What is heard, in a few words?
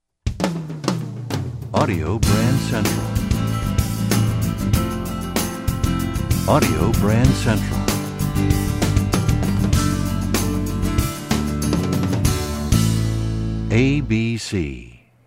Desert Wind, radio jingles